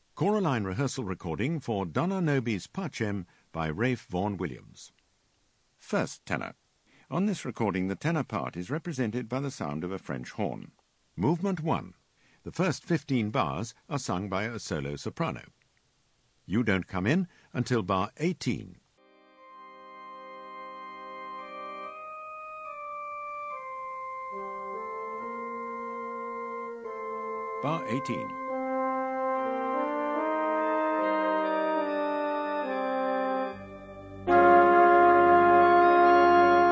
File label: Tenor; Vn Wllms Dona Nobis